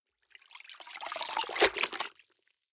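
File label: clothes water up down hard, household; haus-garten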